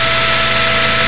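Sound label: table saw; REDNECK; RAMPAGER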